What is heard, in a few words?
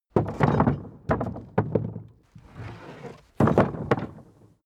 carriage hatch sequence